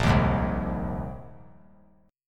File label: Bb 7th Suspended 2nd Suspended 4th Bb, 3C 3F 3A 3D; Bb7sus2sus4; strummed; 10,10,11,10; JGuitar